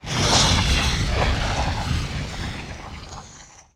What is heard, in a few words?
lava burst; Level4 Hidden World